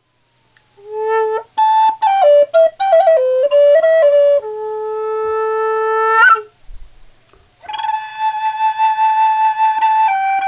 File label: Am Rimu Beginners Flute; Southern Cross Flutes